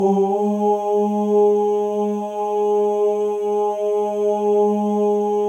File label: male ohs; Spectrasonics; Symphony of Voices